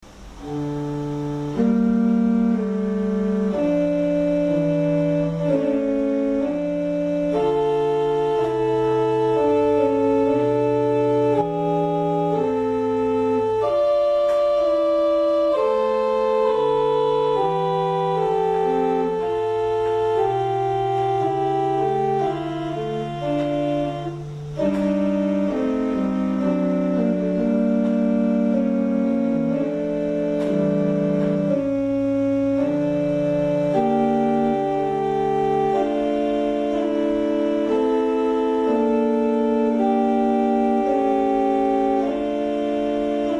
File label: gemshorn